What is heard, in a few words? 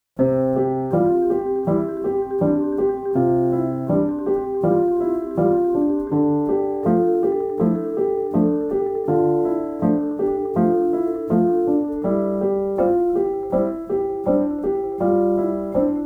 flute, girls